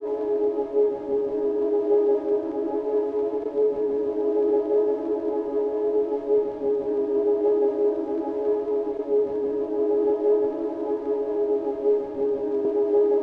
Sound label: Atmosphere - 124 BPM C# Min; FX; Cymatics; Orchid Sample Pack